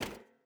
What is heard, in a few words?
footstep barefoot metal run; Rust